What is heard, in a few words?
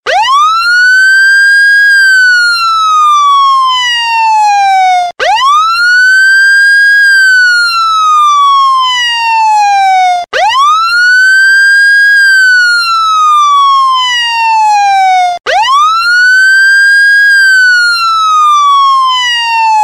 Police Siren